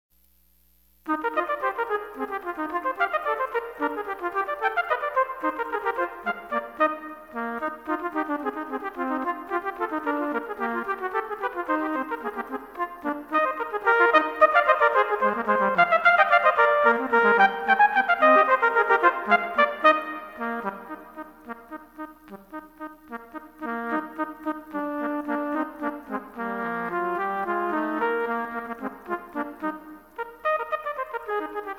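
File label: We Wish 2 trumpet; Trpt Duets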